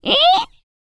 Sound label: FEM. HUMAN, Vocal, R&B & Dance; ILIO; Vocal Planet VOL-4